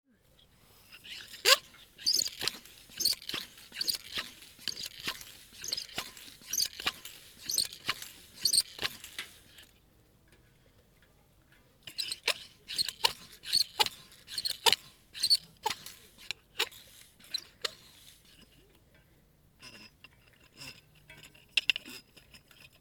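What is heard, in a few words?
inflating a bicycle wheel with a bicycle pump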